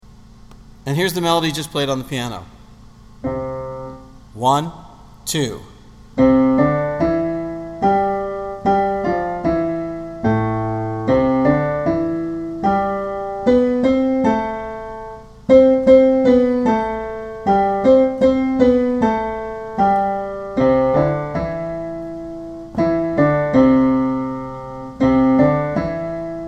melody pitches

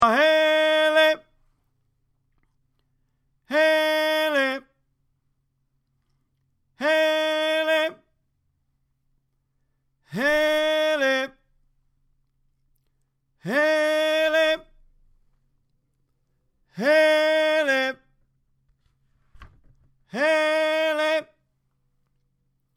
tuba tenor

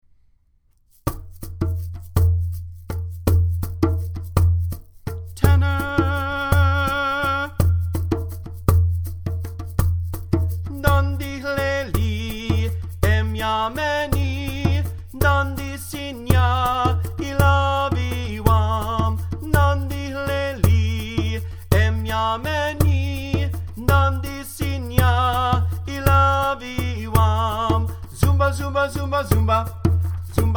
ndandi hleli tenor

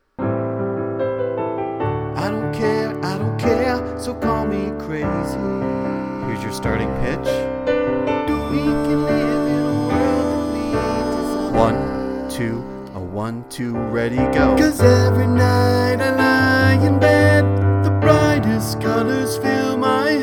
excerpt tenor

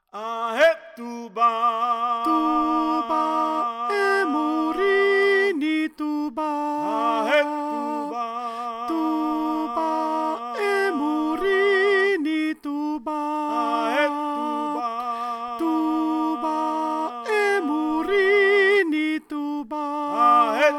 tuba alto

